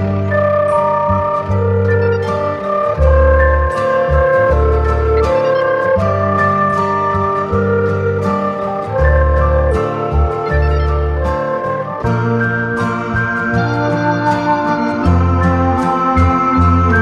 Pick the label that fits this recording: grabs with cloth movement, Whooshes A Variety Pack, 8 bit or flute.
flute